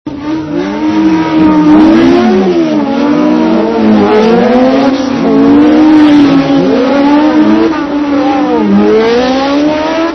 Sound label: parallel, motor